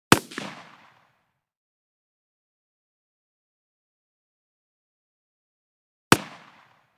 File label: .308 Blast Long; Hollywood Edge; Heavily Armed